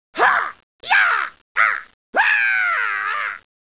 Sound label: hit jump; starscream